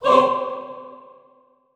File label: HOO C3, Classical Choir, SHORT HOO; Best Service; Extended Classical Choir